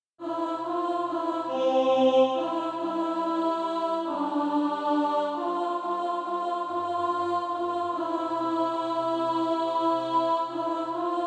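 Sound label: alto, Sheet music (SATB) including Practise Music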